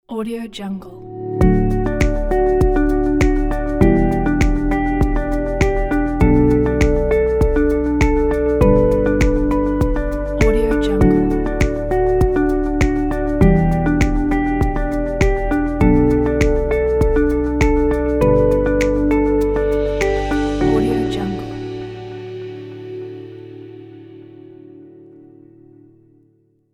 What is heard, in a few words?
happy logo intro corporate